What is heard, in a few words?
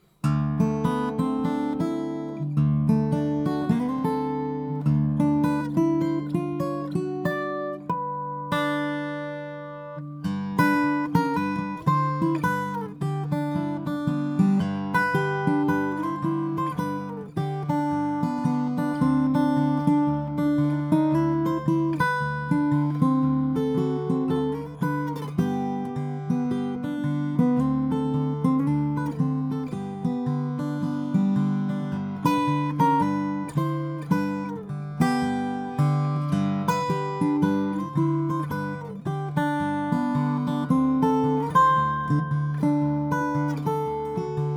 ORTF